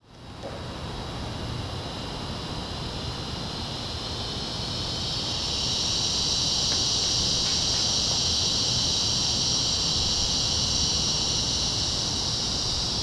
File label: Old Sixth Ward Kane St and White St, ambient; Houston